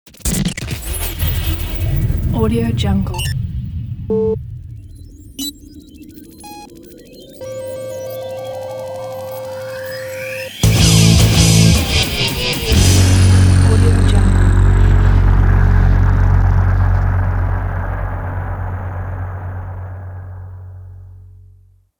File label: radio jingle ident